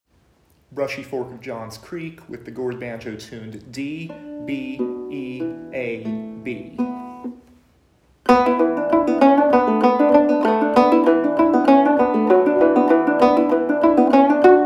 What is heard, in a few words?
GOURD; Brushy Fork of John's Creek